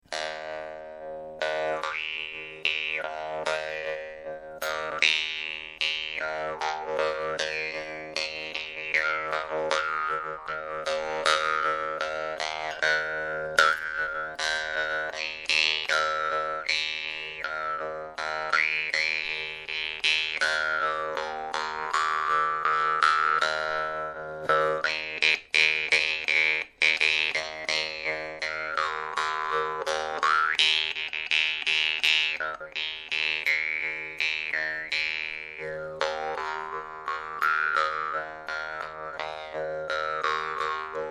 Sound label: vargan; russian vargan ustinov middle